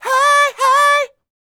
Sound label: Vocal Planet R&B & Dance; ILIO